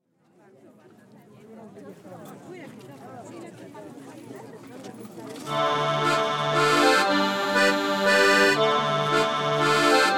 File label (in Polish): akordeon; Nagrania Lokomotywy